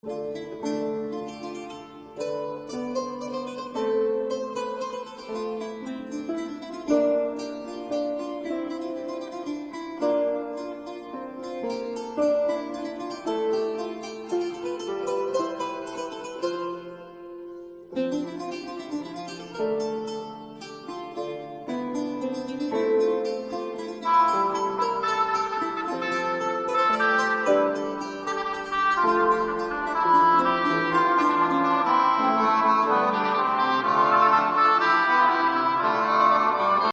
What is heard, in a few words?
harp; glittern